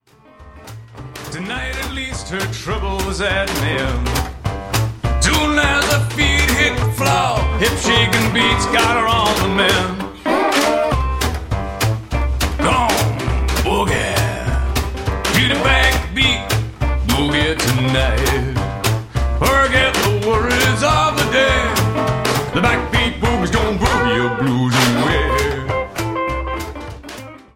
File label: Back Beat Boogie